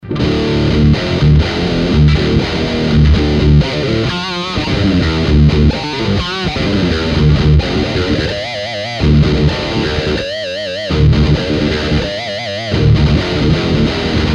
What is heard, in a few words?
GTS90 DIST; Paul Red Smith